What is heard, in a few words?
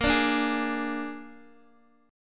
Bb 7th Bb Guitar Open D, chord